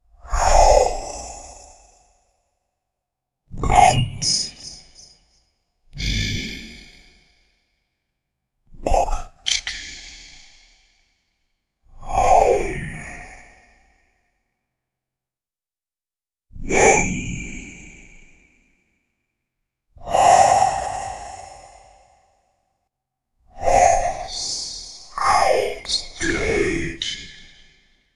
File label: constant spell conjuring; mystical